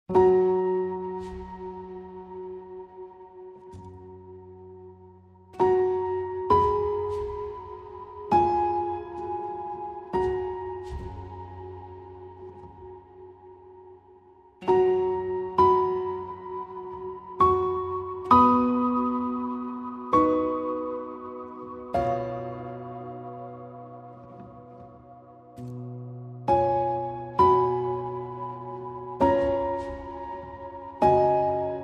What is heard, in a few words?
Piano Only